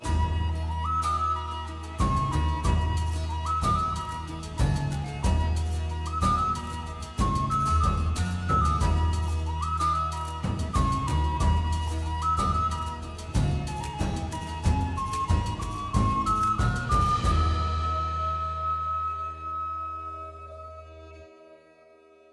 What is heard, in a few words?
Low Key Positive Track; Crusader Kings III